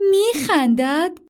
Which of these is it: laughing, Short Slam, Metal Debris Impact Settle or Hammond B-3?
laughing